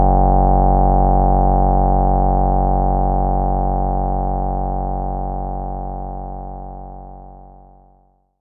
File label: pulse width; ringtones